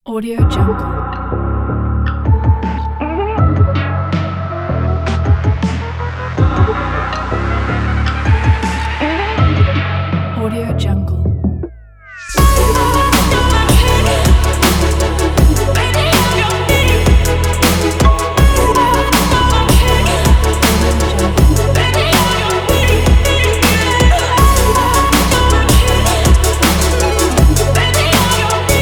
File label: future